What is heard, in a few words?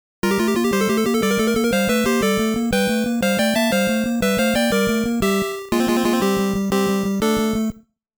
Start BGM; Game Sound Museum Famicom; Excitebike; VS. Excitebike